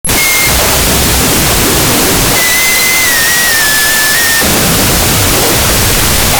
Barn Door Open; 1001 Sound Effects; Foley

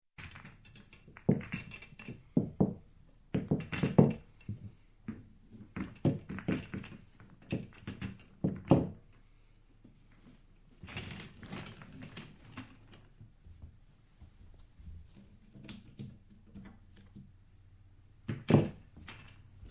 Billiards Rack Balls; Sound Ideas 2000 Series

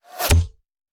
Bullet Impact; Sound Ideas; Guns

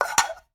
Plastic Plate; 1001 Sound Effects

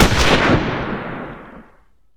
Gun, Rifle, Canyon Blast; gun and weapon sounds; captain audio; fully loaded

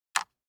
DICE on Wooden Tray, Grab, Standard, 3 Three Dice; Samples; ArticulatedSounds; DICE Sfx Party (Extended)

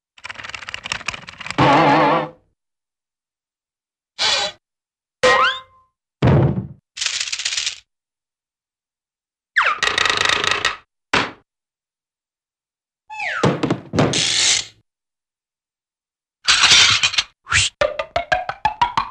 Flapping - Bonks - Crash, Cartoon; Hollywood Edge; Cartoon Trax

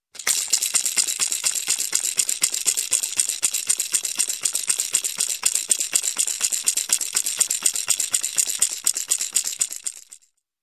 Toy Rattle Toy, Rattling; Sound Ideas 2000 Series

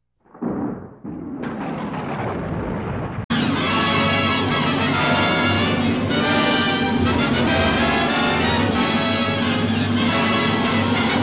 Thunder Storm; 1001 Sound Effects; Halloween Scary Music and Sound Effects

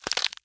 BREAK Crack; stereo; Universal Sound FX; BREAKS & SNAPS

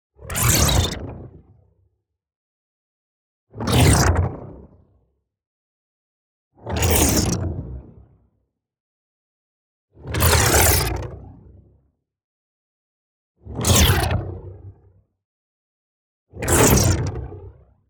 WHOOSH LARGE FAST Granulation Modulation Pass By; boom library; Neon

